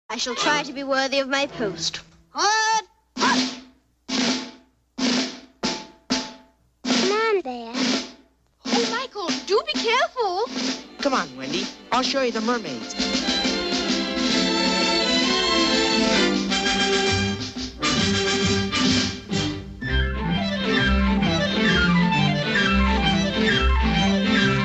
Karaoke; Disney; Peter Pan

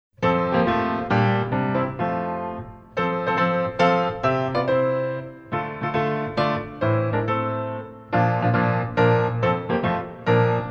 Polish mazurka and pas marché

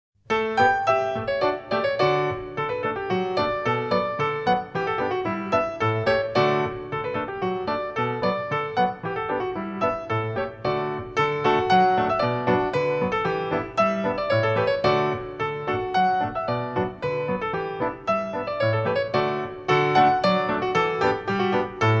Krakowiak

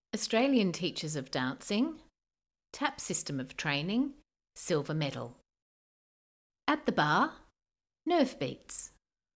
Nerve Beats

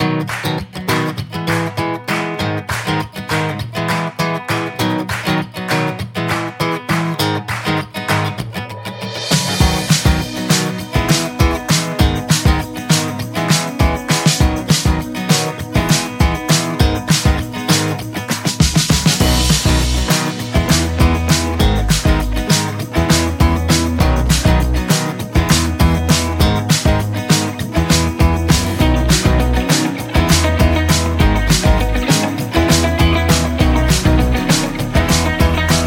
Three Beat Wings Music

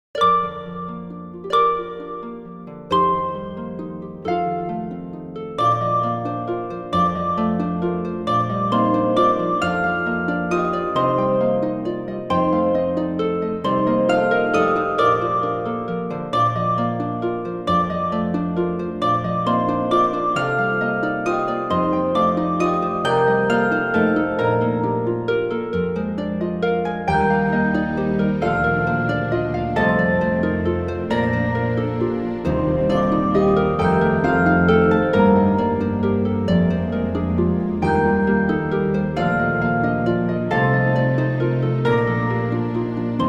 Bend and Run

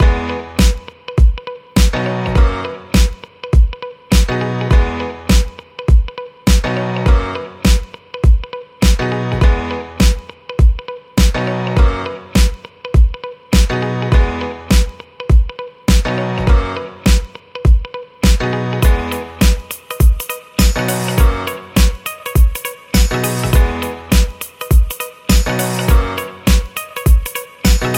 Silver Medal Pick Up Combination Music

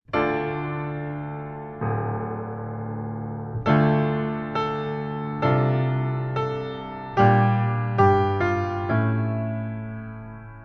Character Reverence

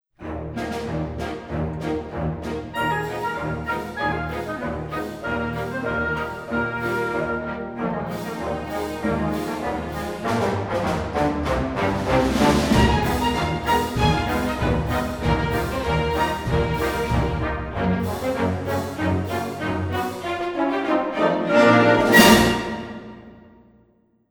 Turns Solo Cakewalk; Golliwogs

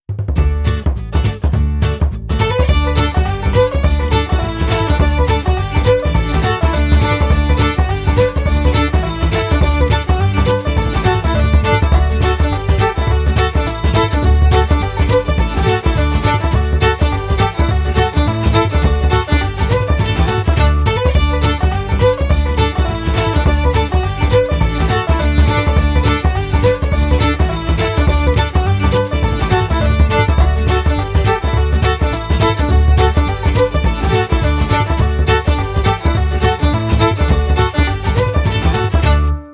Claps and Jumps

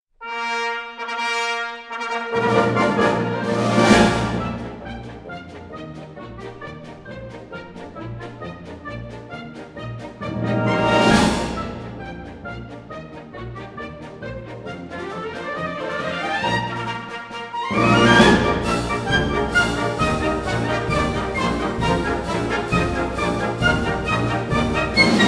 Step Hop And Parallel Assemblé Continuous Coda; Le Corsaire Pas De Deux

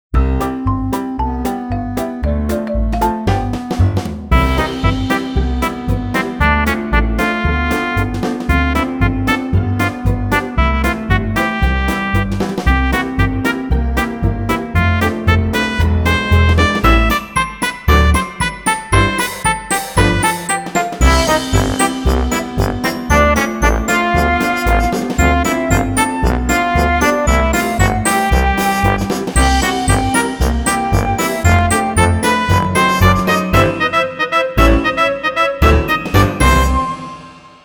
Warm up

